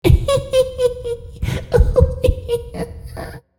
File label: LAUGH